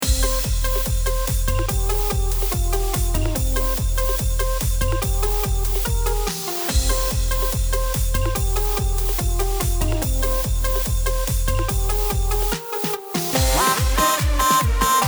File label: Hardstyle